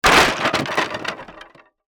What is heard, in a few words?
wood smash